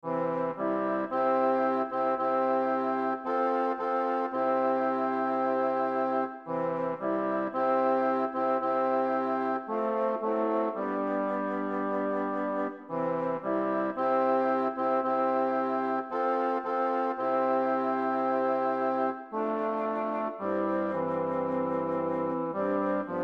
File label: Kum ba Ya Tenor; chalice choir practice files; kum ba yah come by here